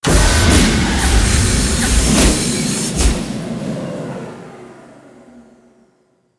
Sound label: Maintainer Tube Up